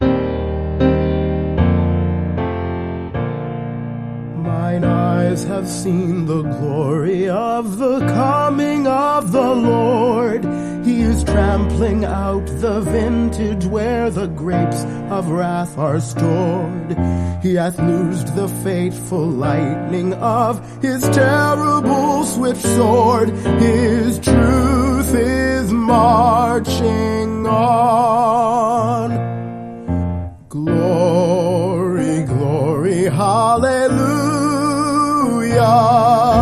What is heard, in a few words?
Battle Hymn Republic; Trad (PD)